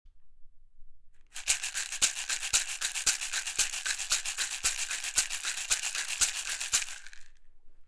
Rassel

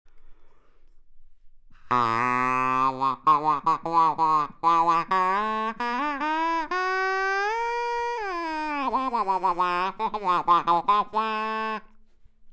Tröte

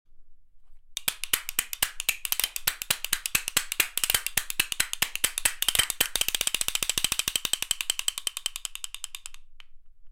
Kastagniette

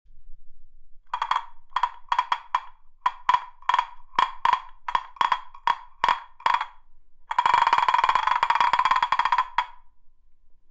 Spechtdose

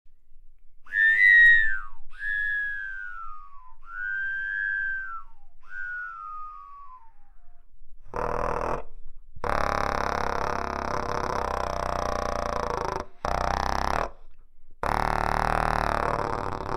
Trompete

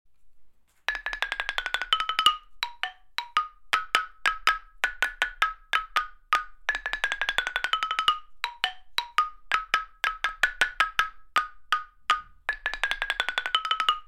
Holzxylophon

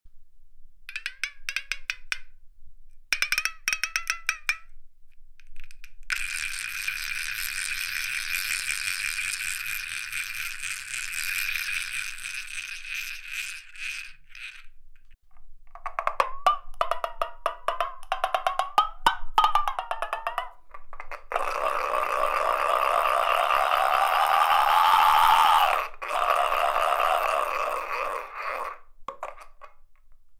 Rummelpott klein

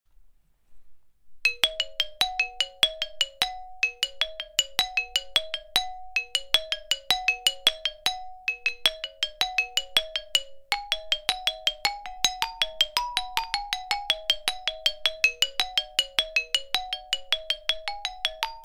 Kachelophon

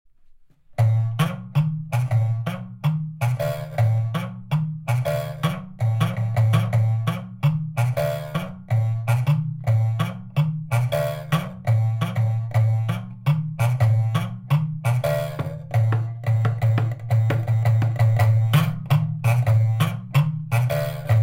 Riesenkalimba

